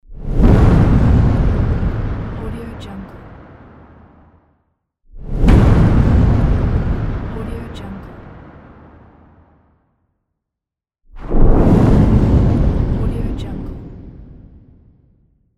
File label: big explosion